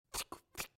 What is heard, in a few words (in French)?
ciseaux coupe rien; Fourniture